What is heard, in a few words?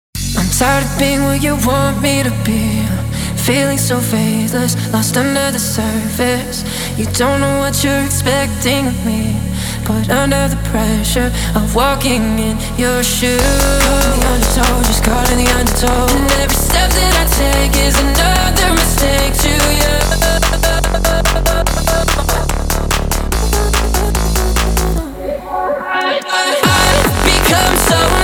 hypertechno